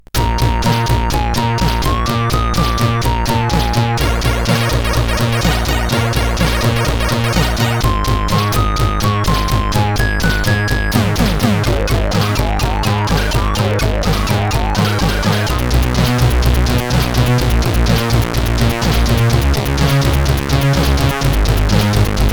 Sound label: CSG8580R5